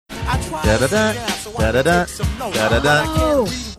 singing along to sample

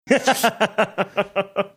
laughing

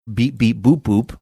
beep beep boop boop

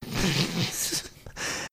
stifled laughing

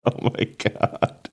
laughing saying oh my god

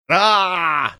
aaaahh

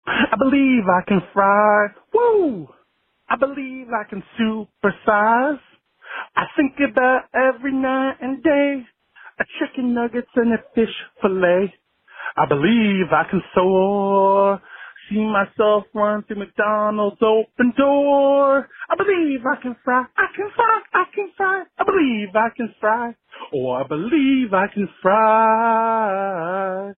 singing

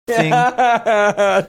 funny laugh

